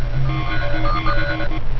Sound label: erase